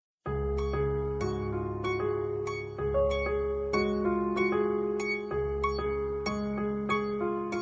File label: Ring Melody